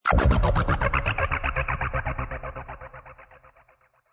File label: Aliens Low, Low stuttering alien sound; Low Alien Sound 2; Strange Tones; Sound Design